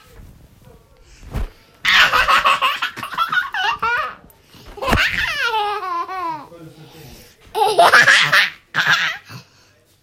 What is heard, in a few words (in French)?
Rire